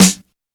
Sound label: Snare Boom Bap